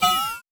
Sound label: hooah; Truth-Hz - 1-Hit KO Sample Pack